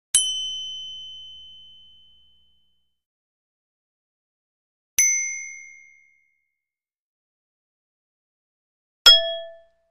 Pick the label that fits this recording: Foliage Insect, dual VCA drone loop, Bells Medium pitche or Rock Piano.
Bells Medium pitche